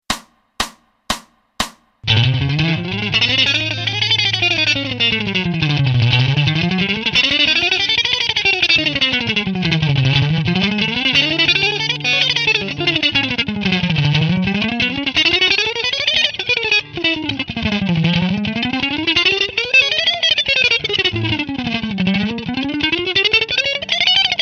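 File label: Chromatic Scale sextuplets fast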